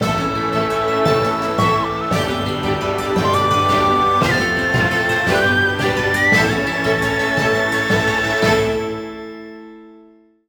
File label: Horse Racing - Cup - 2nd and 3rd; mario sports superstars 2017 3ds gamerip